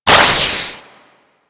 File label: Flashbang Ignite; Warframe; Grineer Death Squad; Weapons